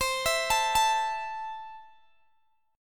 C 6th, C, down, Guitar, Open G

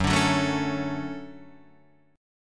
D-Major 7th Gb

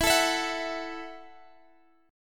E Suspended Add 9th E; strummed

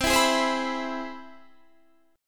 C Augmented Major 7th, Guitar, Standard; strummed; JGuitar

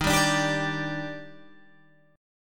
Eb Major 7th, Guitar, Open G

